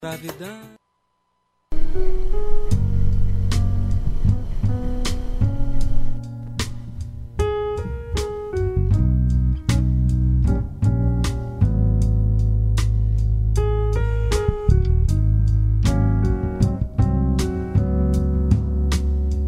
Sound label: jazz